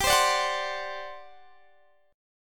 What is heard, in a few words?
G Major 7th Suspended 4th, Guitar, Drop D; down